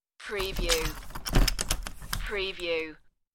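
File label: Shutter Close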